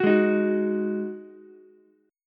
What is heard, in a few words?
Eb-Minor Double Flat 5th-Eb-11,8,11,9-1-down-Ukulele; Ebmbb5 strummed; JGuitar